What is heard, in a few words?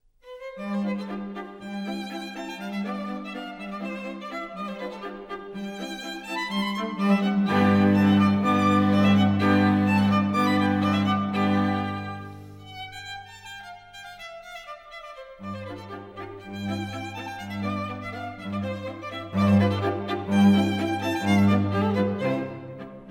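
string trio; eybler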